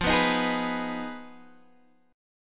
F Minor 7th Flat 5th, Guitar, Open D

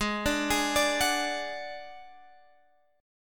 D Major Flat 5th, G sharp

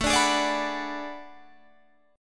B Minor 6th A; Bm6/A strummed

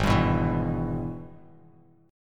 Ab Minor Major 7th Sharp 5th, down, Bass Standard; strummed; JGuitar

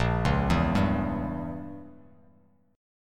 A sharp Diminished 7th, Bass Standard; arpeggiated; JGuitar

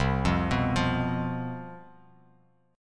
C Major 7th Suspended 4th, down; arpeggiated

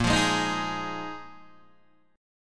A sharp 7th Suspended 4th down Guitar DADGAD

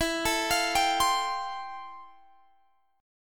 F Major Add 9th E; arpeggiated

